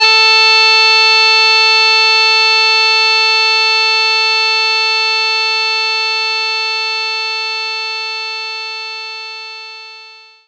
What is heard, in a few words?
accordion A4